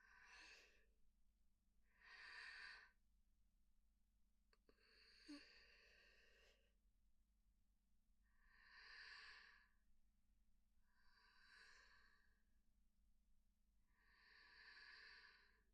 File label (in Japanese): 添い寝ループ; ルイナ